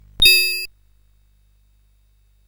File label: Dark Castle, CSG8580R5